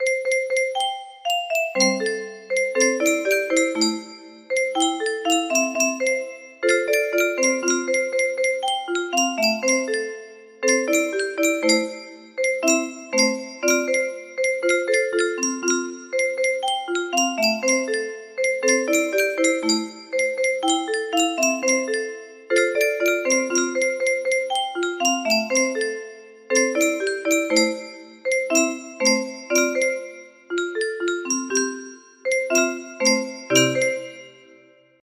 c key, music box melody

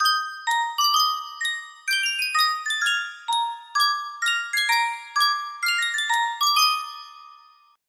Reuge Miniature Music Box, music box melody

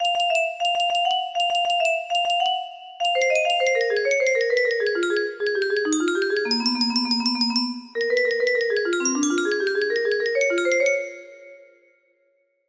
music box, melody

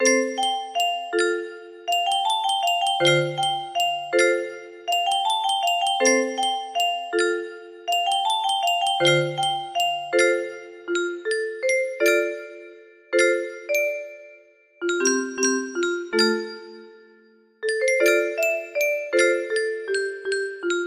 5 semitones down, music box melody

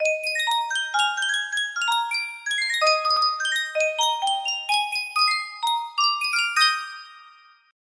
miniature music box; sankyo

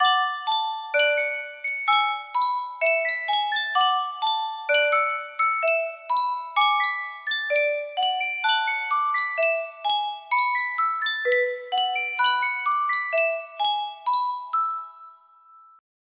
yunsheng custom tune music box

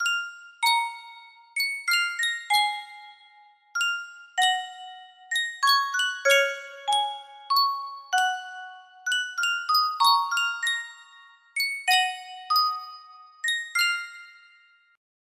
Sankyo Music Box, music box melody; from up on poppy hill

